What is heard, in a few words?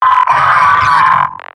pyro pain severe; vo; tf2; mvm